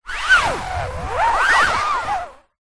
household canvas frame picture large fingernails rub scrape weird tone; zapsplat